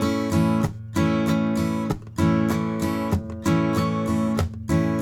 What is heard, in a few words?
RIFF, Blues Guitar Samples; ILIO; Fretworks